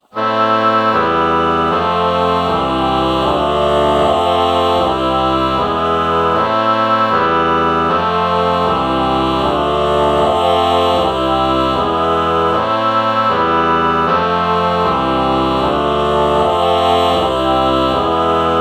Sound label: ah oboe chord morph